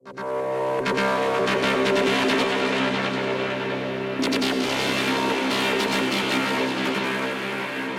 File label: Vortex Drone; Instruments; Laboratory